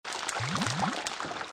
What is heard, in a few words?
Boiling; pucca n friends ios